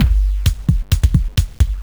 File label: Beat, Drums, Eclectic Electronica